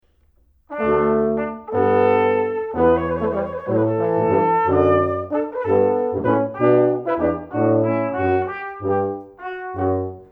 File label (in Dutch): karaoke; educatie